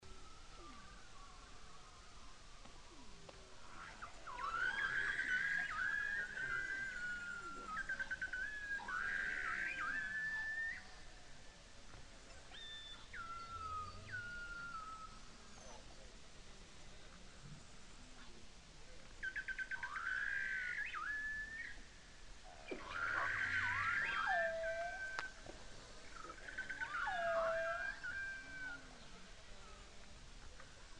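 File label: beluga, Bering Strait; Sounds of the Arctic Ocean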